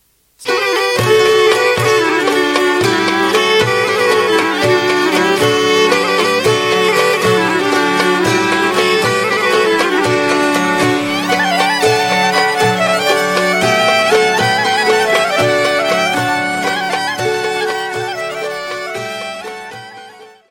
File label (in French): Grece; Trata Megaron